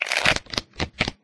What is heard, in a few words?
shuffledeal; There; ThereClient